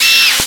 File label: Augh!; SNES; Champions World Class Soccer